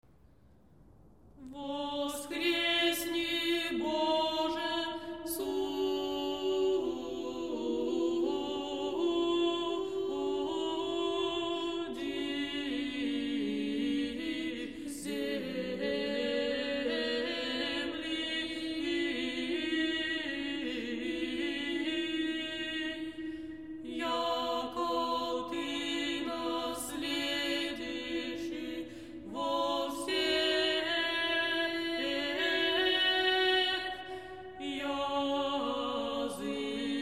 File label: Arise, O Lord, Russian Spiritual Music